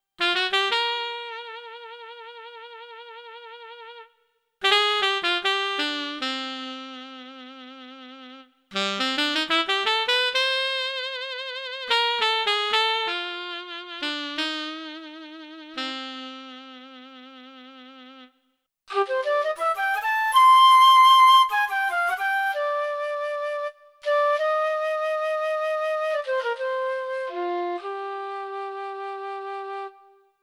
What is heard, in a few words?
MP11 Orchestra